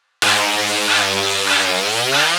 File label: Reese